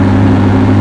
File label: Low Lp, Engines; SuperBike2001